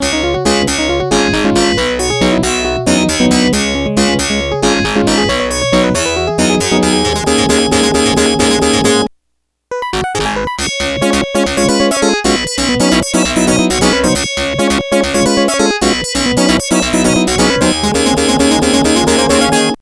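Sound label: background music; Bionic Commando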